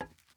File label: glass step